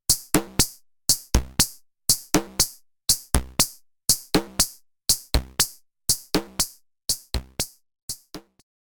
Studio Electronics Tonestar 2600; AR Outs To Outs

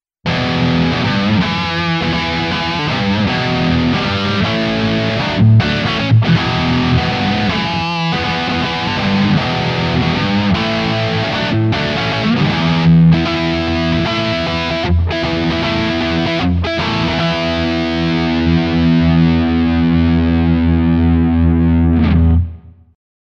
Epiphone Les Paul 50s Neck Highgain Rhythm

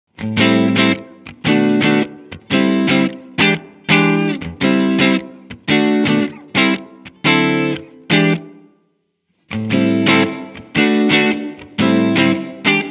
Blackstar AMPED1 clean Hall

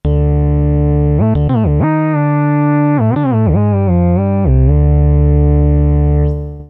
mono lead

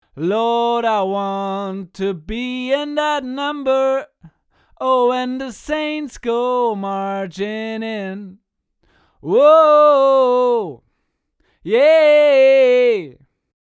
Coles 4038 Singing; Zoom F8

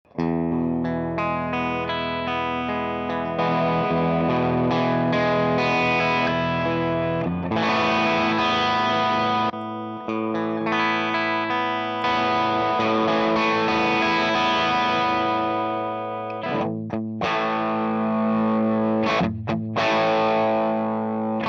low gain

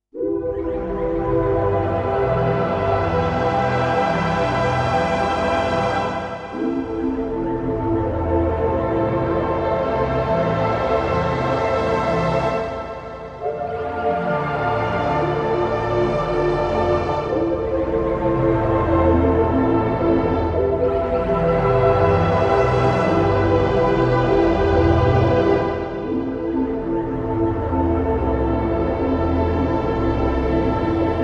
Yamaha TX16w Deep Water Pad

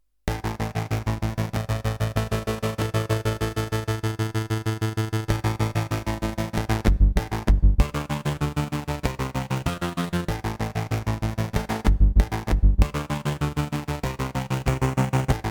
Octapad

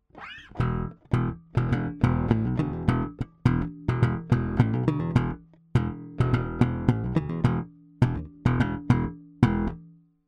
E Bass Big Amp

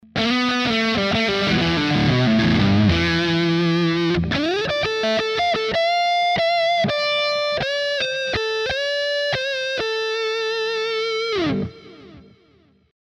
Epiphone Modern Les Paul Neck lead

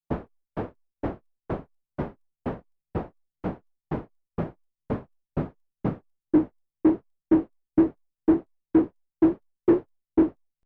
Snare

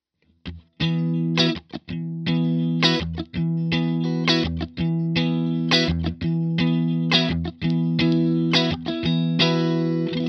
Clean Bypass

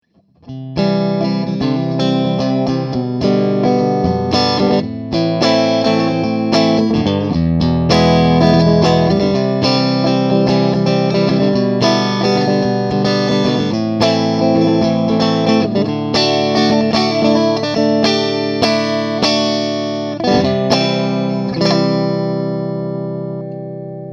Clean Single Coil

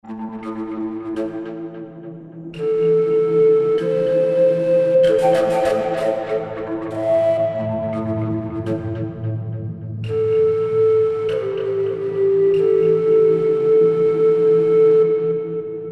Fujara

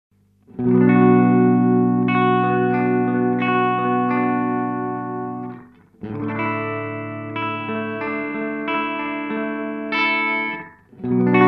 Ibanez ADMini Analog Delay reverb Sound

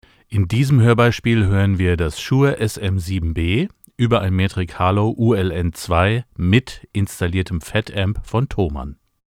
SM7B with FetAmp; thomann fetamp

